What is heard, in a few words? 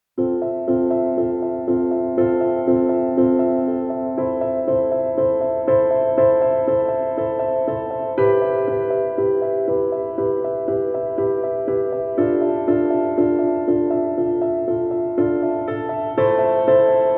Piano; Inside Story Piano